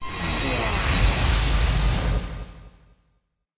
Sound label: forcetower damage, monster